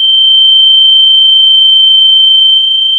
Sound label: Sound of a tea kettle whistling